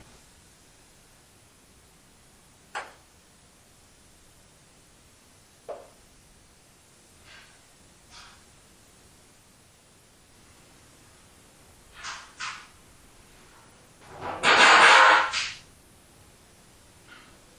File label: Loud crash in kitchen